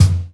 good jazz bass drum one shot f key

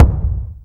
subtle reverb kick drum sample c key

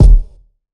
00s subby kick sound d sharp key; kick drum

